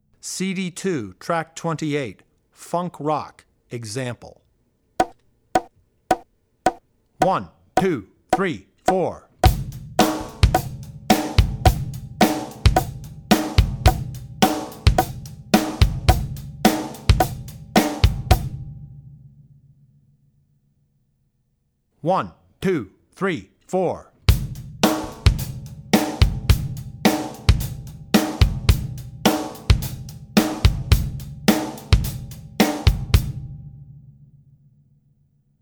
Funk Rock; Drummer's Bible Tracks

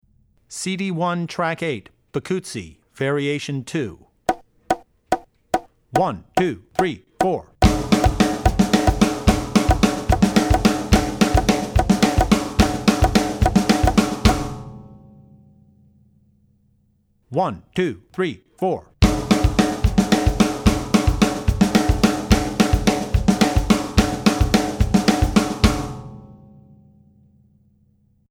Bikutsi; CD1 Track8 Bikutsi (Variation 2); Drummer's Bible Tracks

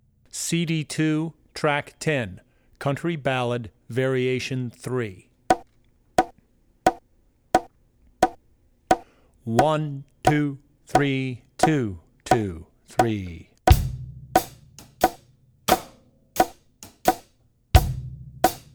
Country - Country Ballad; Country Ballad (Variation 3); Drummer's Bible Tracks

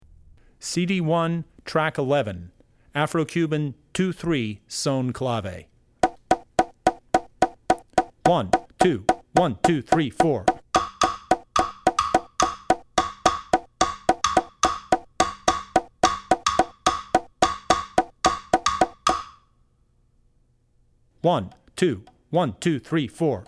3-2 Son Clave; CD1 Track11 2-3 Son Clave; Drummer's Bible Tracks

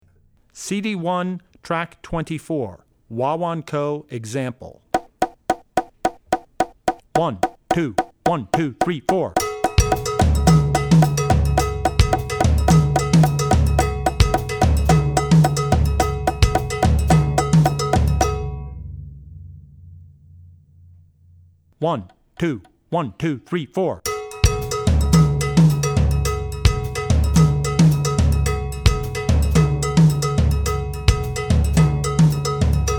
Guaganco; Drummer's Bible Tracks

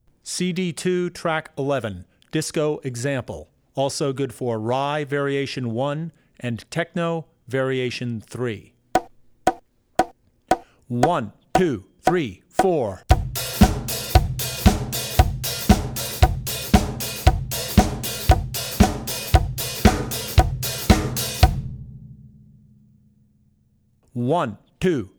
Disco - Disco; Drummer's Bible Tracks

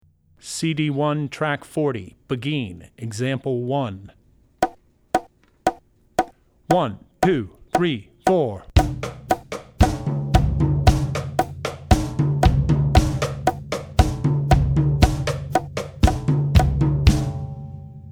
Beguine; CD1 Track40 Beguine 1; Drummer's Bible Tracks

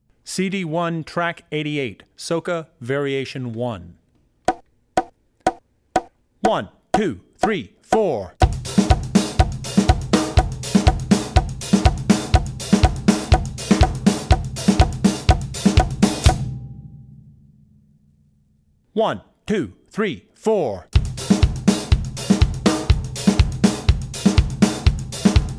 Soca; Soca (Variation 1); Drummer's Bible Tracks

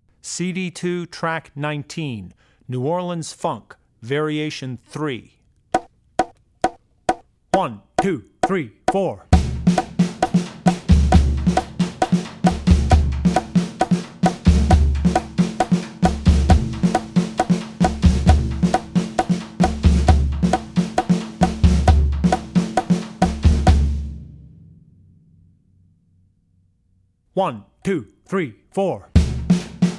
Funk - New Orleans Funk; New Orleans Funk (Variation 3); Drummer's Bible Tracks

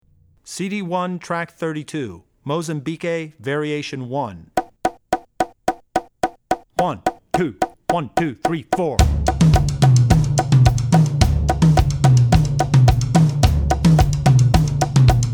Mozambique (Variation 2); Drummer's Bible Tracks

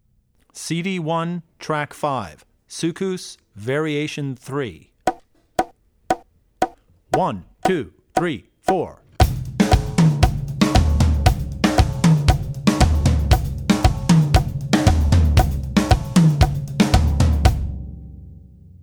Soukous (Variation 3); Drummer's Bible Tracks

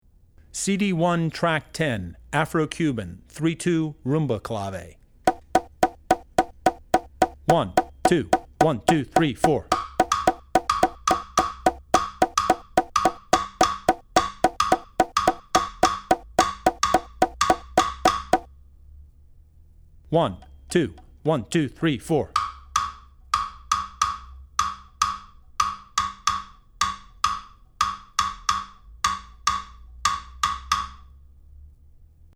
3-2 Rumba Clave; Drummer's Bible Tracks

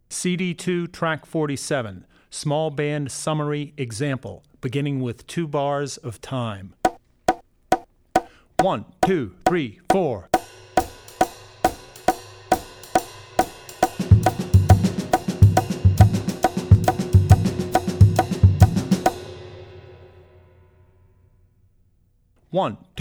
Jazz - Small Band; CD2 Track47 Jazz - Small Band Summary; Drummer's Bible Tracks

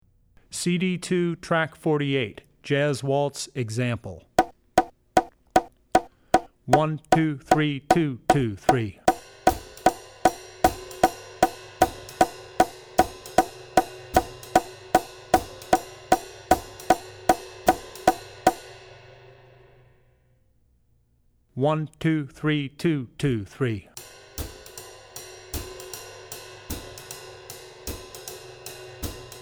Jazz - Jazz Waltz; Drummer's Bible Tracks

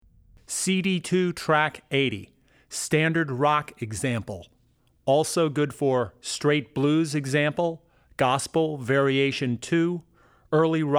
Rock N' Roll - Standard Rock; Drummer's Bible Tracks

